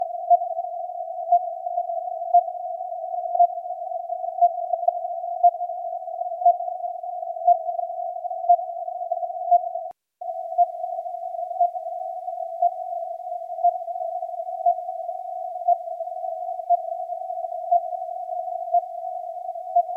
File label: CW Channel Marker, Kanalmarker der israelischen Marine; 10355khz; Beide SDR's bringen das Signal gleich gut. Empfang in CW und 100Hz Bandbreite. Antenne: ML200; Cloud-IQ; Perseus